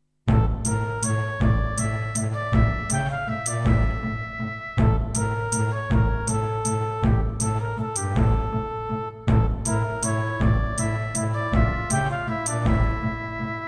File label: Anteg EasyMIDI EM-100